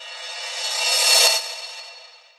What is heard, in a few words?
drum hit normal; osu!